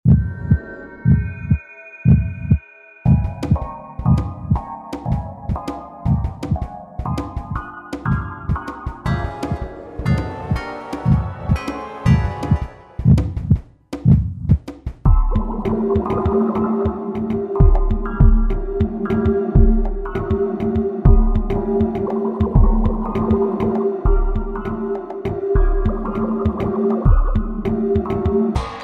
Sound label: Music